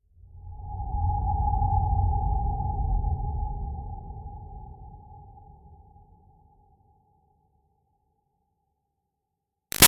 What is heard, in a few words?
Swell Low Sheen Ethereal; Sound Design; Swells; Big Room Sound